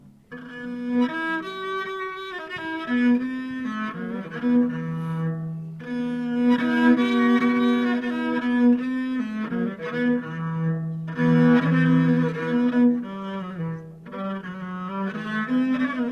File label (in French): Interlude viole de gambe